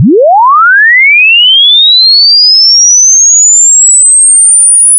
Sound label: sweep 44100